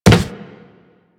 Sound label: Walk Wood, Footsteps; Warframe; Operator